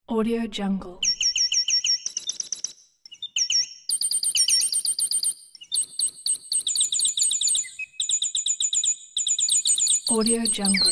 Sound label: cartoon birds